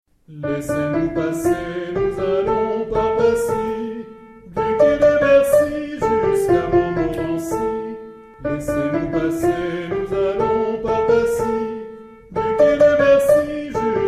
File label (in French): laissez nous passer canon